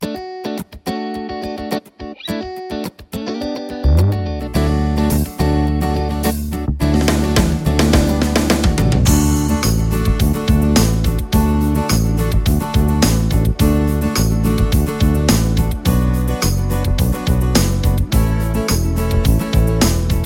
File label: Pop Guitar